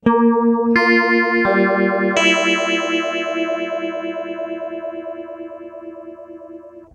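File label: clean guitar stereo polyphase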